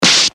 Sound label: hit